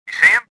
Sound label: escaping; worker3